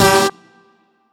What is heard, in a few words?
ending hit